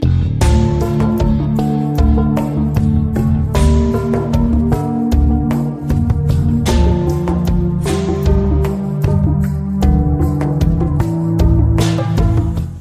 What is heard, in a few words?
Mobile Ringtone